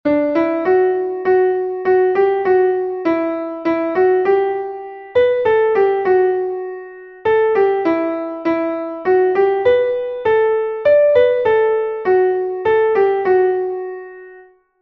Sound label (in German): klavier; MelodieMeister